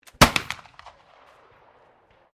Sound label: MUSKET FLINTLOCK 50 CAL AMERICAN FRONTIER REPRO FIRING